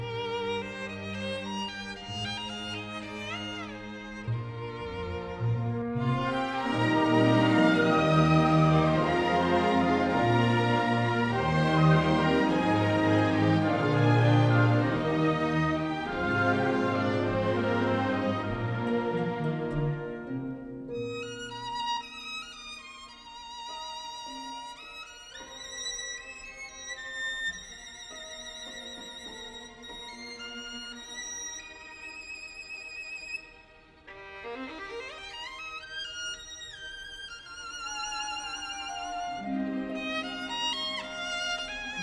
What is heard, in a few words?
Violin Concerto